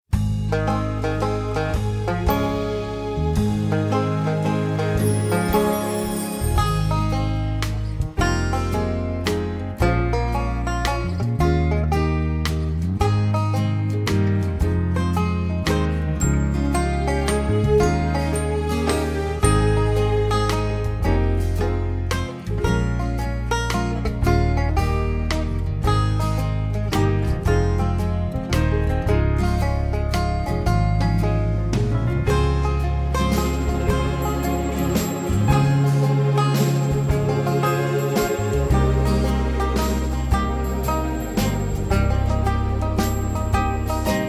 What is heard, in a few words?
Instrumental only